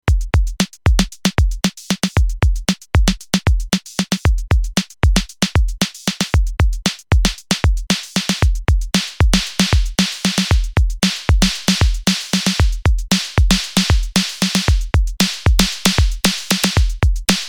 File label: Snare